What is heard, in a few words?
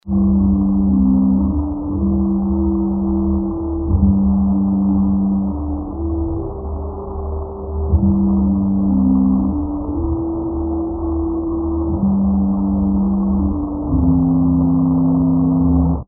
Mary MIDI Plant; VisualMic; microphone; the revolutionary tech that can extract audio from images